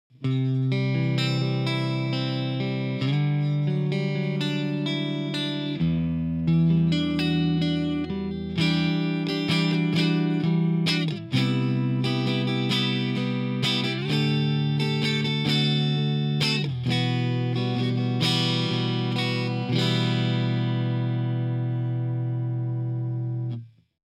pick compare clean ultex jazz xl

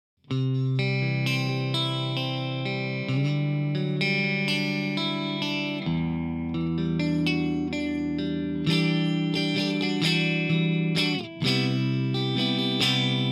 pick compare clean

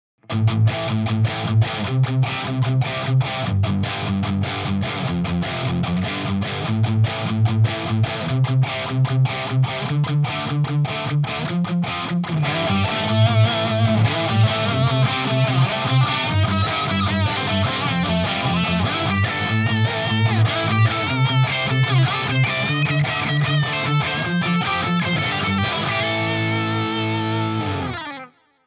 pick compare od tortex 1mm

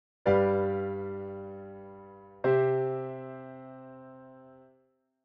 dominant motion

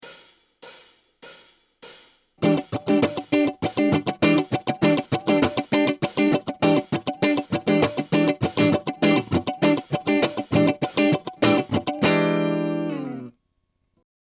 strumming cutting basic

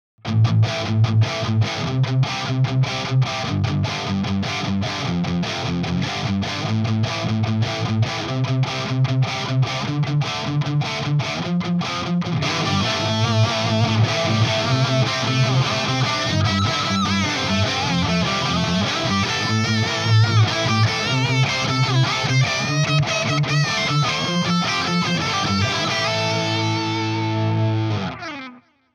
pick compare od

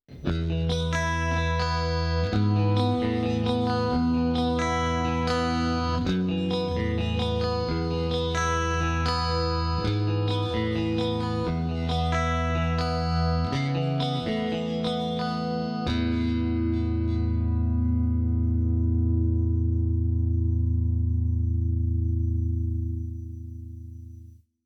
Beneath the Surface Stereo

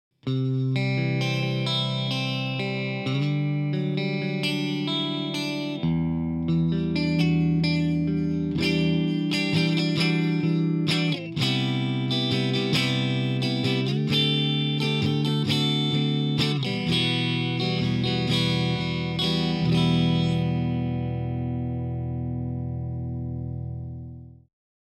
pick compare clean

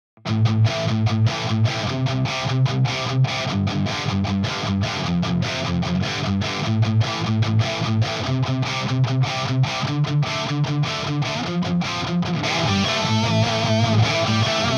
pick compare od

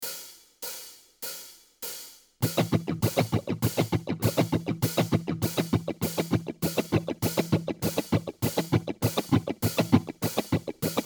strumming cutting basic

